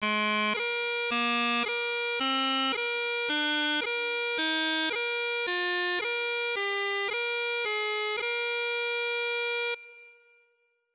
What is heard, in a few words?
High A Doublings, piping